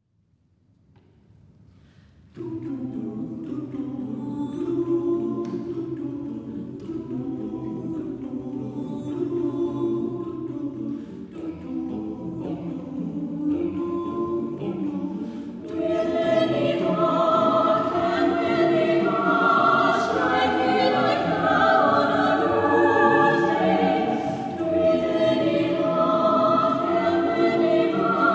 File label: In Paradisum